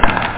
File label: bang; A Matter of Time